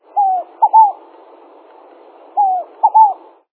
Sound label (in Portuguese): fogo apagou